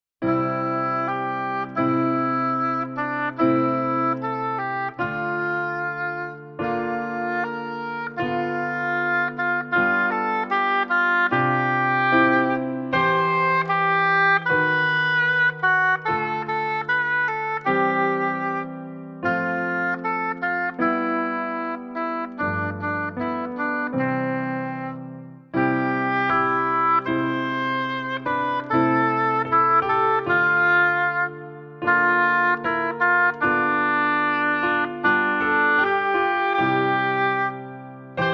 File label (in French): Hautbois